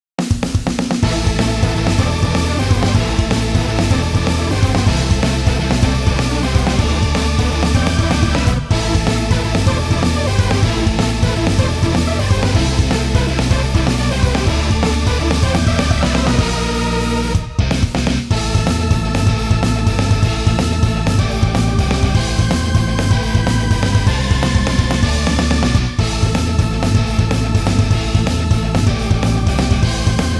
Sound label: off melody